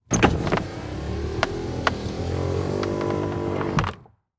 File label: Auto Saturn Open Window Interior; Transportation